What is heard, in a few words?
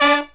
LOW HIT, Brass